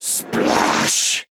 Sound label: Principal Water; AudioWizards